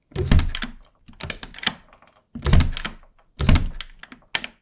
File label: Opening Glass Door